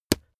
boxing gloves parry punch hard; sport freizeit; boxen

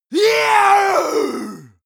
male combat attack long

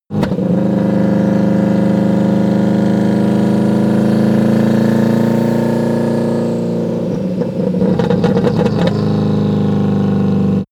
car vw t1 accelerate splutter slow down

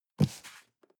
cardboard box medium grab slide soft; objekte

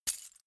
key ring large catch; objekte; schluessel

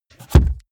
car bmw e46 interior sun visor shut

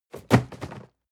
cardboard box medium fall high hard; objekte

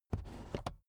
car headrest full adjustment soft

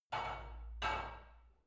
barbell only replace unsteady reverby soft; sport freizeit; fitnessstudio

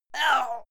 male fight pain short; schreie; menschen; soundpool

